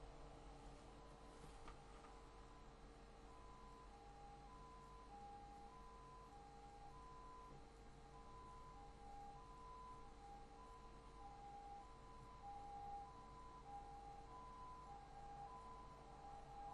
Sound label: toilet siren kyukyu